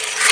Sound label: retract, srifle; SINDEMO; weapons; close